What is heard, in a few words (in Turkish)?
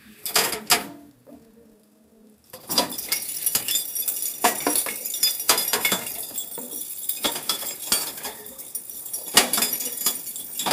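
yürme sesleri, footsounds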